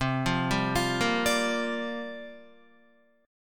C 7th Suspended 2nd Suspended 4th, C, down, Guitar; arpeggiated; C7sus2sus4